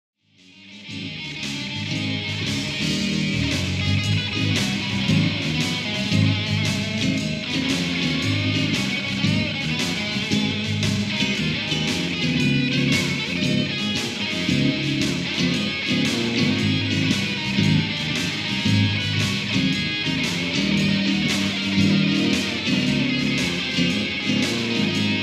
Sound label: Blues